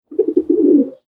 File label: pigeon